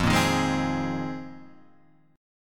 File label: A sharp 9th Suspended 4th F, guitar